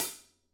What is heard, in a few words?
Closed Hat; Digital Sound Factory